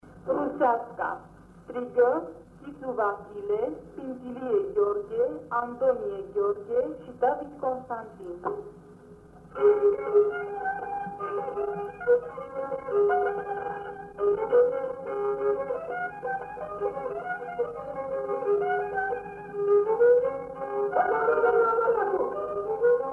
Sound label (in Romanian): Rusasca cu strigaturi la vioara; jocuri si cantece batranesti; Vaslui